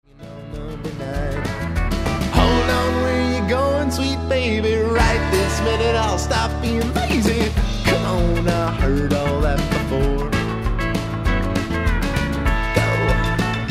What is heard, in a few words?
Country